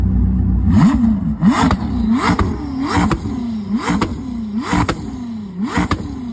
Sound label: Koenisegg; carface